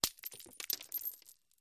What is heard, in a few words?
Glass Impacts Debris; windows & mirrors